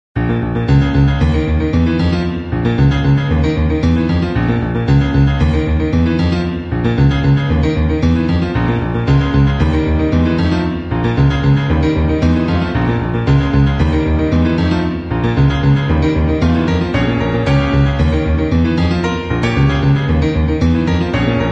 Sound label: Piano Cover